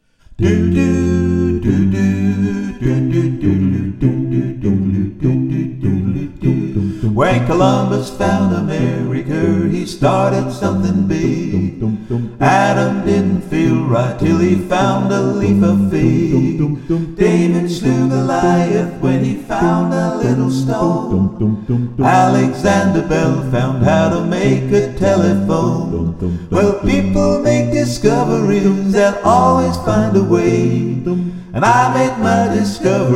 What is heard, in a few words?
All Voices